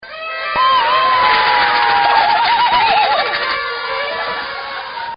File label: New Years Cheer; Holidays And Parties; New Years Eve